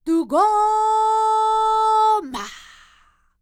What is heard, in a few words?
Vocal Planet World Voices, NOMADIC